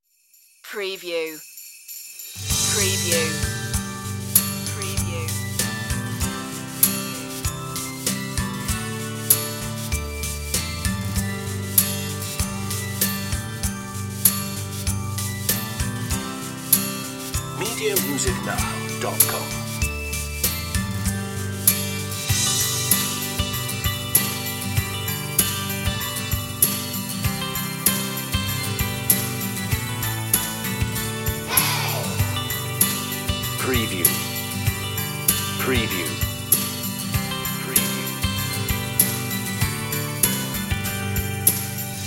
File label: children underscore version with hey